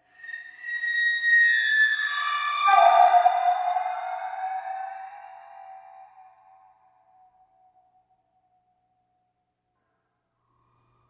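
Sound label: Horror Scifi Background; horn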